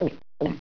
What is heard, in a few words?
DRINK; THEREALM